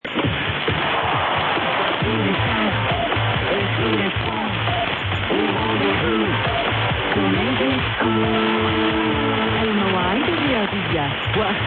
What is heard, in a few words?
morocco jingle